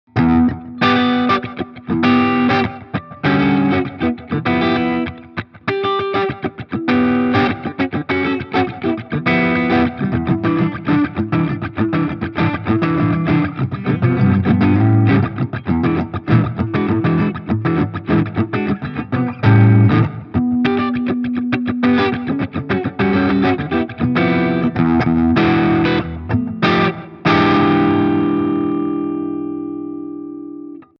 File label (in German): Supro Delta King 8 Combo Gain max. Boost off beide PU parallel; Tone 3h